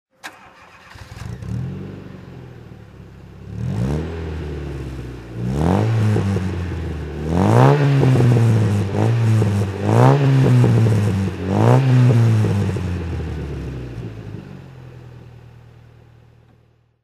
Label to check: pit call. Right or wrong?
Wrong